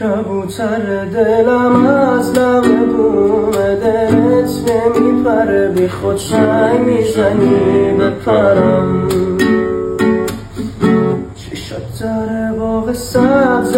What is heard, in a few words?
rap